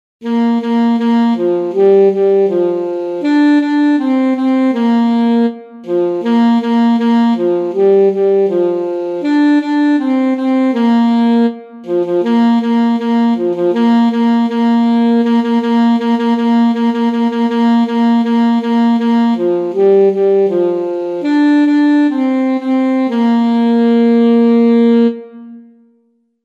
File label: alto saxophone; old mac donald had a farm